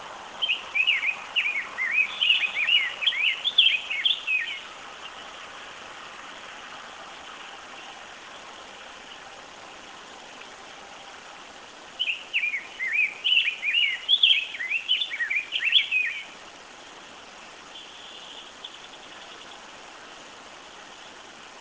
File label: rose breasted grosbeak, birds